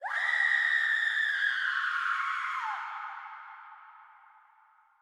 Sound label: scream; cstrike; zm japan